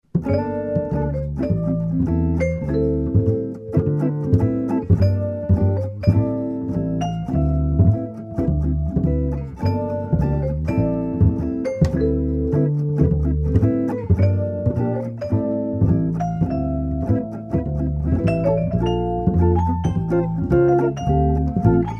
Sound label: Samba trio